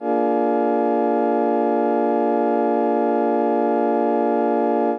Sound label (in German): akkord b7 as2 hoch